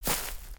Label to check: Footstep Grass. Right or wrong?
Right